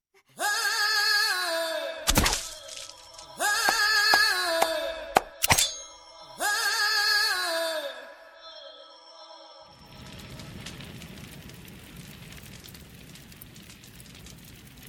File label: acapella; Godfather